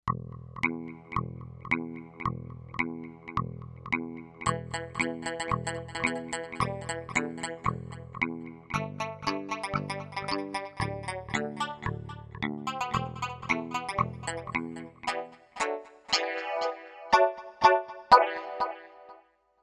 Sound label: Cla Tron, Kawai K5000S factory presets